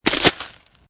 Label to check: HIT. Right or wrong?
Wrong